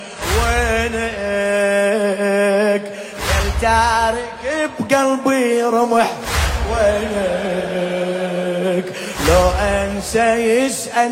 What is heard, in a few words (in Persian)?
مداحی عربی